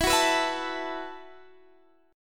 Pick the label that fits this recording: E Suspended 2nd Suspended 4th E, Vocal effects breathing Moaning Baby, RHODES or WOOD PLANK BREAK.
E Suspended 2nd Suspended 4th E